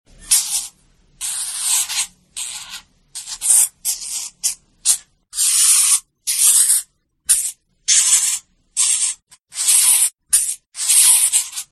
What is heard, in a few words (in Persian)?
moosh e bozorgh